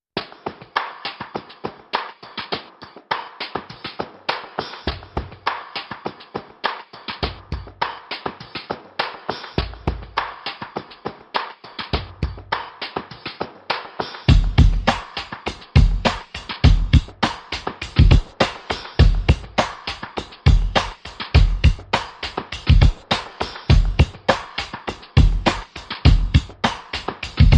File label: Funk, Drums Only